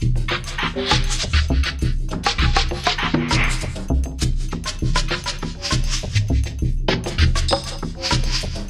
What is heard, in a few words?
120 MAAR LP 1, Industrial Loops